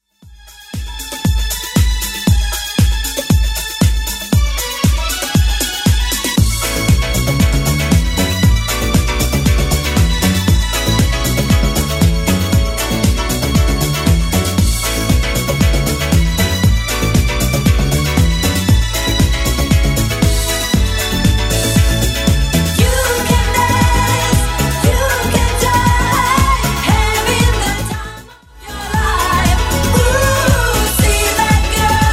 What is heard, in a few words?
70s Club Remix 115bpm